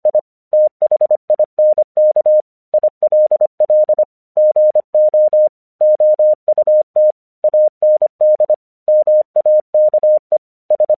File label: English 25wpm